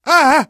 hurt major, survivor voice, manager

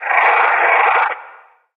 advisor screen; episodic; Source engine

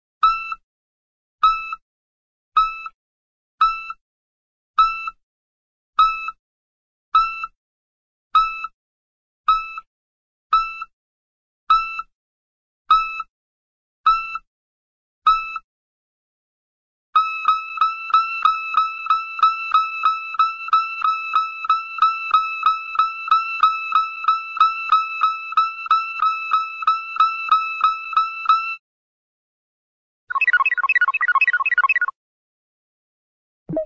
sci fi robot beeps; cartoon

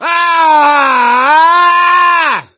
death scream, gambler, survivor voice; source engine; left 4 dead 2

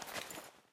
taunt machete draw; video games; source engine; tf

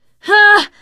negative noise, teen girl, survivor voice; Left 4 Dead; Source Engine

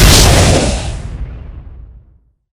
smoker explode, death

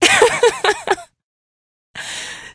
laugh; hl2; eli lab; Source engine

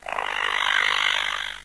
idle, antlion; Source Engine; video game; npc

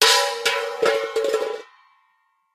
item metal pot drop

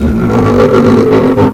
hunter laugh; npc; source engine; ep2; ministrider

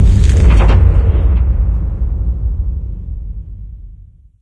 silo door end op; ambient; source engine; ep2; outland

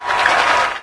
sports critical stop; windows 9x; sound schemes

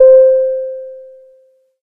table flip notification; video games; player; taunt; source engine; tf

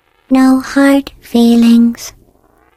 turret disabled; video games; npc; source engine; portal; turret floor

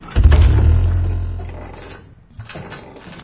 stalker shakes; ambient; source engine; episodic

